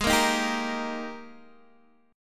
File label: G sharp Major 7th Suspended 2nd, Guitar, DADGAD